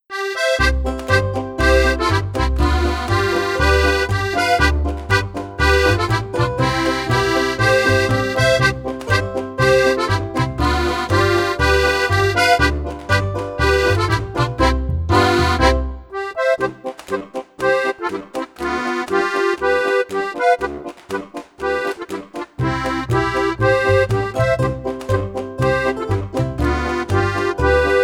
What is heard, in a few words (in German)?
alpine volksmusik